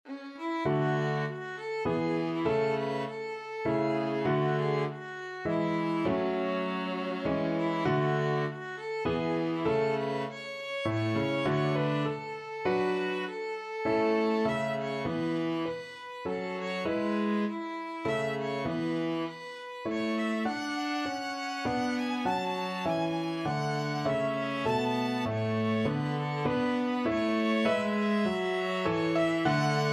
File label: violin viola duet